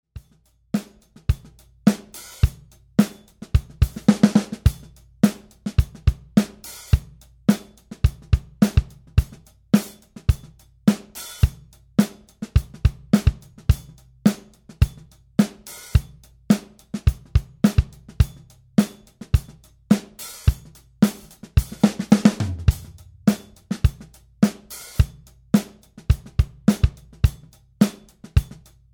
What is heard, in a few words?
80s pop